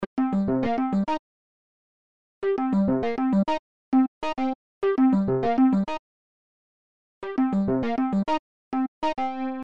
e piano loops

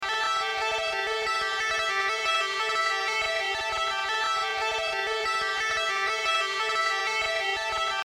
Sample loops

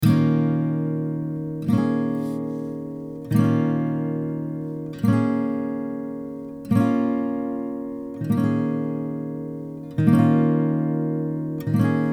D m143 GTR sample

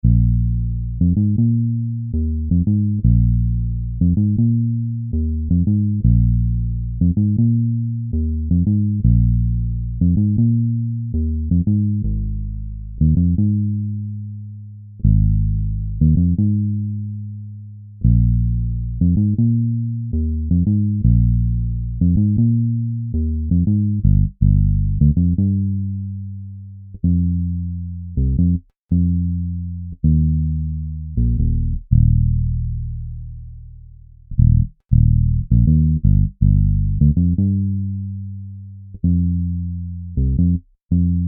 instrumental

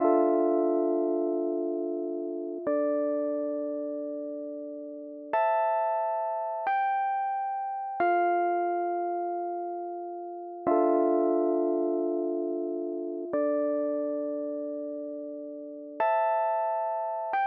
Dm90 Asphalt keys

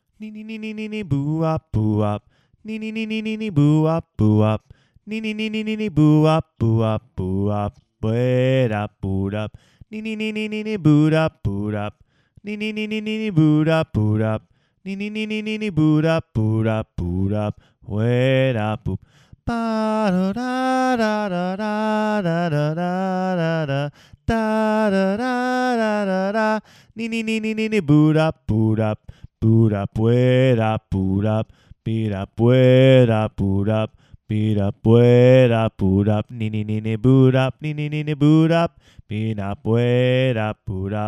Vocal beatbox and doowahs

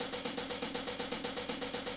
hi hat

